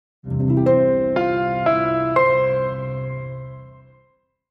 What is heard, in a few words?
gentle piano